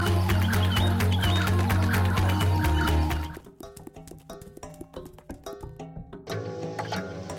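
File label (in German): übergang region; botanicula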